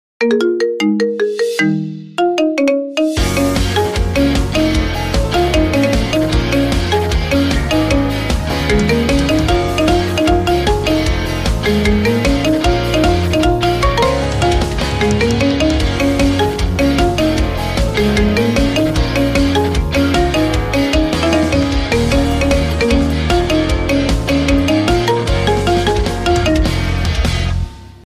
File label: marimba remix, ringtone; jujutsu kaisen